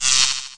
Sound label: Electricity